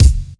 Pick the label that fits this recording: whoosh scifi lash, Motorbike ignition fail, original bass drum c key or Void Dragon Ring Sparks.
original bass drum c key